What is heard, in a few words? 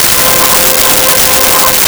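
Synth Strings C5; Nintendo; July 2020 Gigaleak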